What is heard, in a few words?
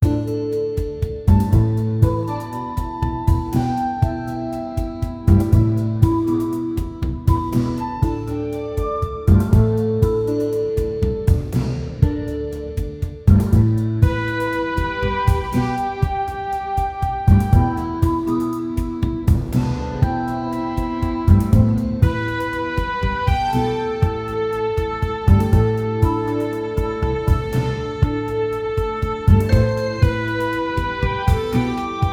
instrumental sad, circus